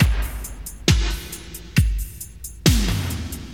68 bpm drum groove g sharp key, Free drum beat - kick tuned to the G# note. Loudest frequency: 1923Hz